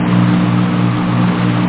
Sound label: BOOT; ASSAULT